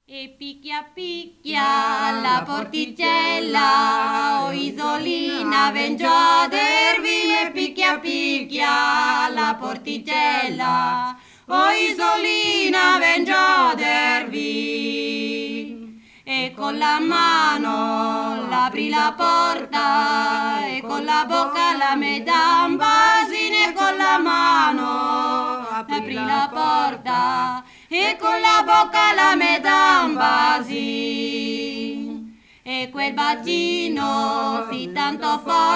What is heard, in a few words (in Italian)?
coro; brianza; e picchia picchia la porticella